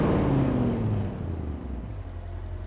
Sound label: throttle off; TDMCarpack; slsamg